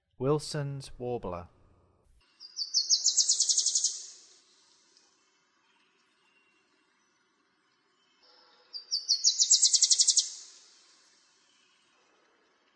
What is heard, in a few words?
Wilsons Warbler, Songs of Birds of North America; Western USA; Western USA Songbirds